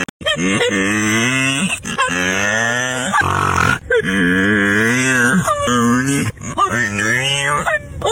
risada pigarro

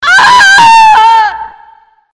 ahhhaahhh, morte feminina

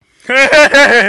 risada

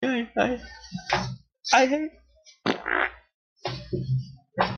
peido

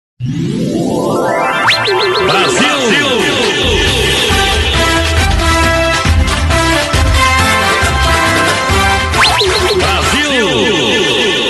vinheta; brasil

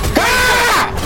gritando; fofo

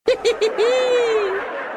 risadinha

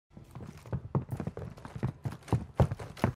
passos; warzone

